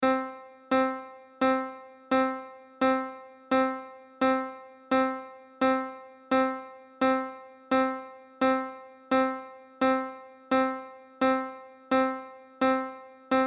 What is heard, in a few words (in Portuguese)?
amostra de pulsos com periodicidade